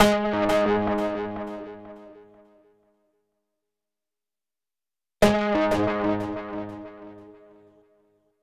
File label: SYNT, Hip-Hop & R&B; USB Soundscan vol.47; AKAI